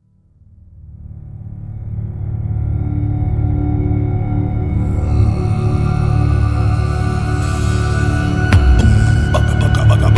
Theme (instrumental Version); Uttama Villain; Tamil 2015

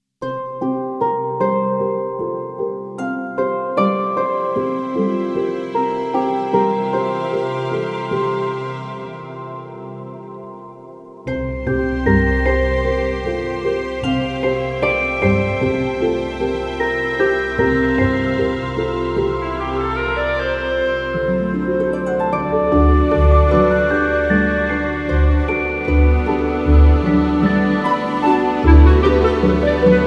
(instrumental Version); Uttama Villain; Tamil 2015

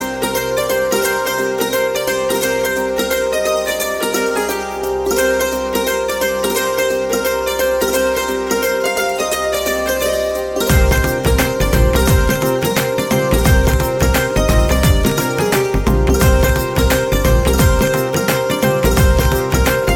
Musical Ringtone; Tamil Ringtones; Veera Sivaji

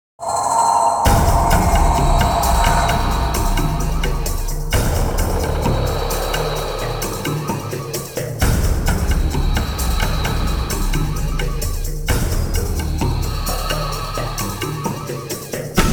Theme Music; Anbe Aruyire (2005)

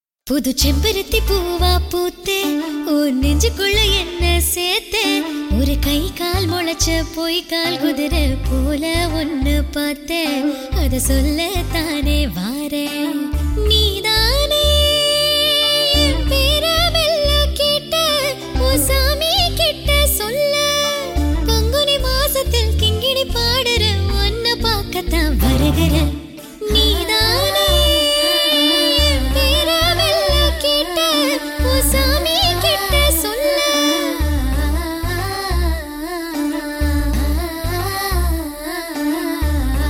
Female; Tamil 2016

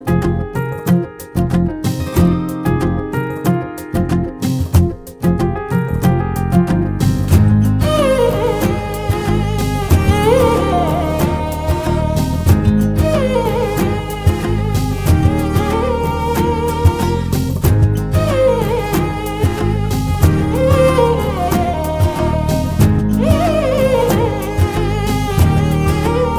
Musical Ringtone; Tamil Ringtones; Velainu Vandhutta Vellaikaaran

